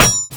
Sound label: Enemy Defended Bullet